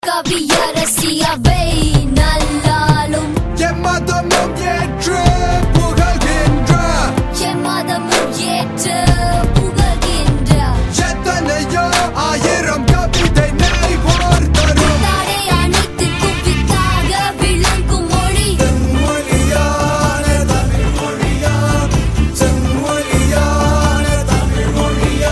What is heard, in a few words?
Tamil Rap